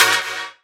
Synth Shots; Vengeance Samples

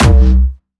C2, Jumpstyle Kick; vengeance essential club sounds-3; VEC3 Bassdrums

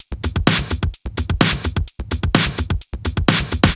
Houseloops 128BPM; Vengeance Samples

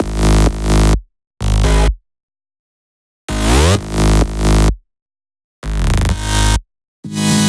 128BPM Synths Root G#; Speedy; Vengeance Samples; Speedy Kit

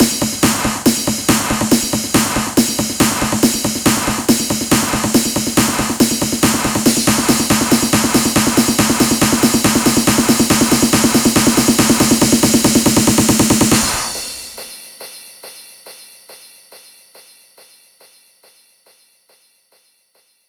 140BPM Ramp 8Bars, Hands Up Trance Fills; Viral; Vengeance Samples

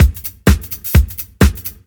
Housebeats, 128BPM; Vengeance Samples; VEH2 Loops

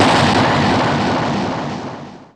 Impacts

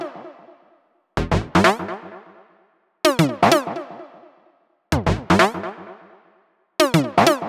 128BPM Melody; Vengeance Samples; Crystaline Kit

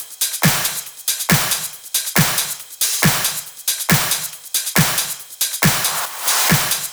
NuTrance Kit 1, 138.5 BPM; Vengeance Samples

